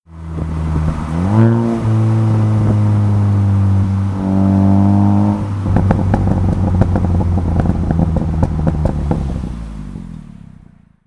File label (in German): sportage 2 gang gas ausrollen 60-0; bastuck